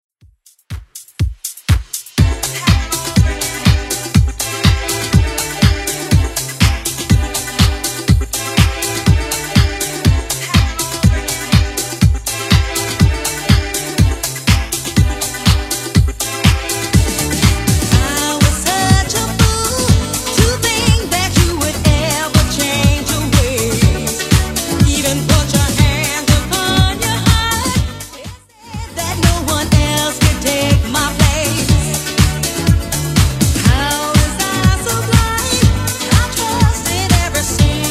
90s Redrum 122bpm

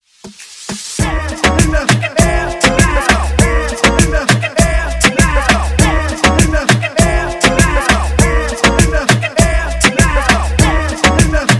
Hype Reggaeton

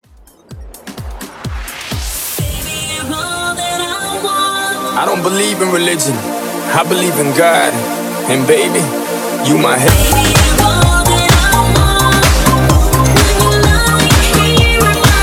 Dutch Clean 128 BPM

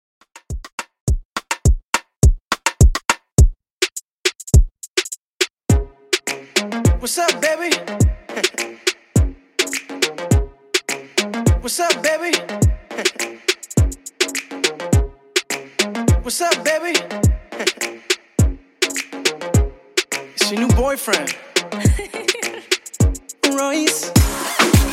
R&B Moombah 104 Bpm

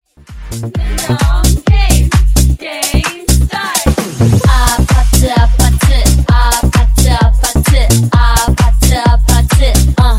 Disko Funk 130Bpm

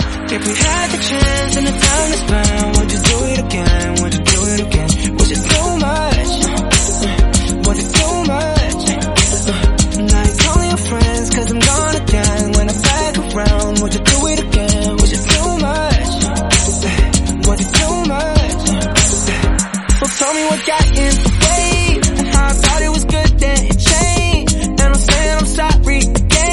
Snippet 98bpm Db

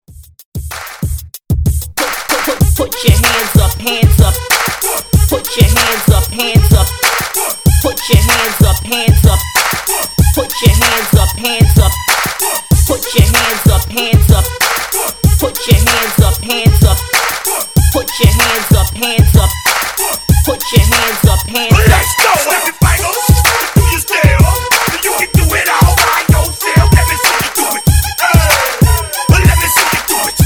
Hype In Outro 95 BPM

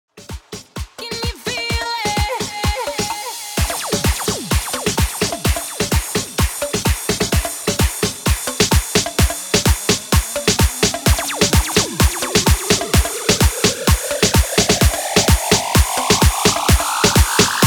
128 BPM; 2021 BOOTLEG SMASHER

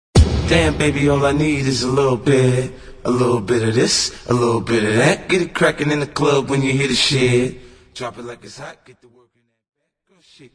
Acap In Out Blend

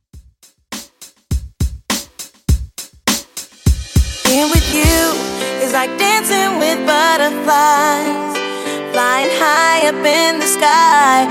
90s Extended Redrum, 102Bpm

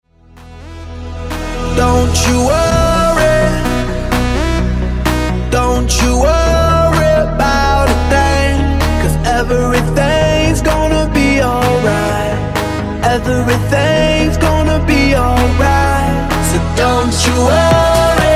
Segue 128 Bpm

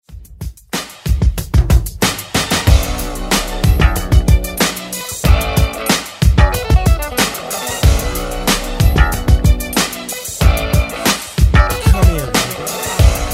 70s

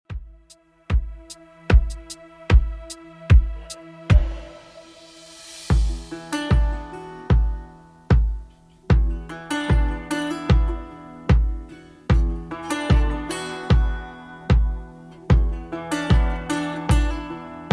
70s 75 bpm; Redrum

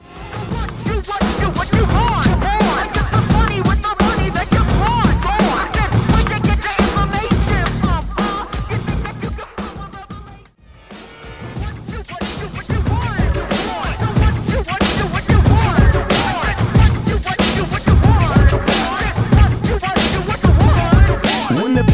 Old School Hiphop Megamix Mashup Re Drum

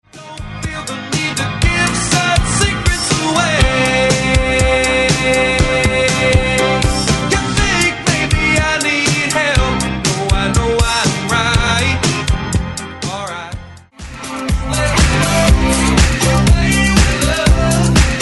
Hits Of 70s 80s Megamix

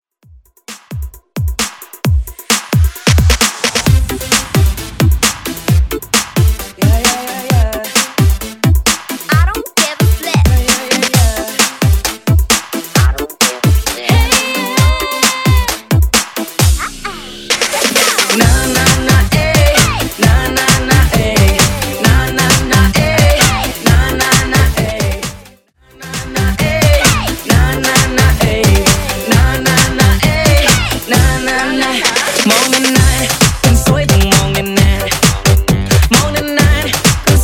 Funky Redrum Clean

